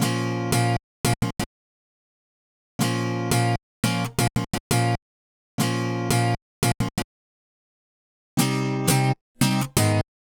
086 Pop Guitar Cut Bb min, Electric; Pop Rock; Electric 055-099 bpm; Pro Tools Essential Loops; Digidesign

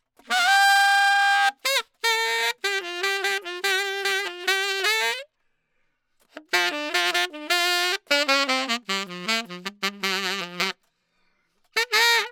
Trad Jazz Sax Solo, Gmaj; Brass; Digidesign; Pro Tools; Pro Tools Essential Loops

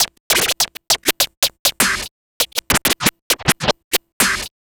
Vinyl Scratch Snare

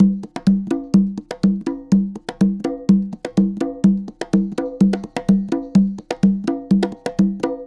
Dance Conga Loop; Dance DJ; Percussion; CongaBongo; Pro Tools Essential Loops; Digidesign

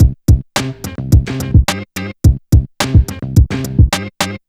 HipHop Old Combo C min; Digidesign; Pro Tools Essential Loops

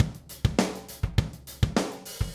102 Rim Grooves, Drums; Pop Rock; Moderate Tempo; Pro Tools Essential Loops; Digidesign

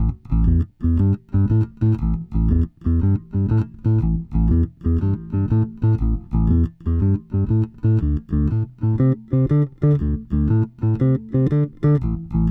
Bass (A Sect), Blues; Digidesign; Pro Tools; Pro Tools Essential Demos; Essential Loop Demos; Demo Session

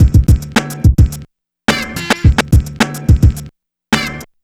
HipHop Boombox Break Combo D maj; Digidesign; Pro Tools Essential Loops

